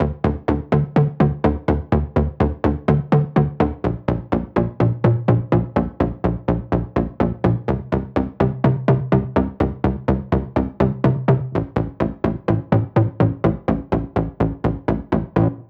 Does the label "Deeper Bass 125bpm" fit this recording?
Yes